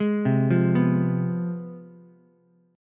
C Major 7th Suspended 4th Sharp 5th, down, Ukulele; CM7sus4#5 arpeggiated; JGuitar